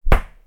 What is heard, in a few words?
knock slap